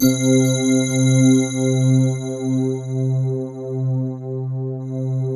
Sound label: FANTASY CHF, JV PADS; Pro-Rec - Synthsonic